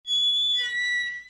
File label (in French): grille grince; Grille qui grince; Bruitages